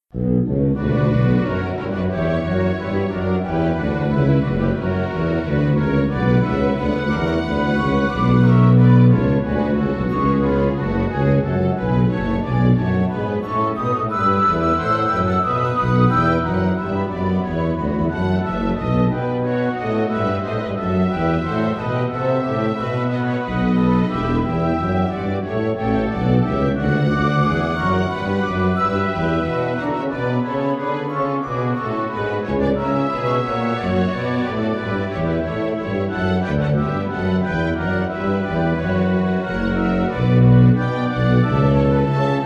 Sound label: Instrumental; Bach; St Matthew Passion